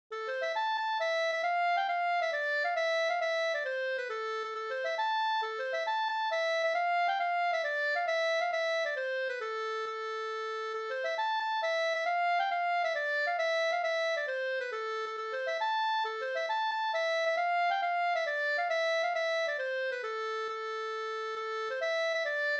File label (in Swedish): mazurka; Uuoma Pertis e Kangas spelman fi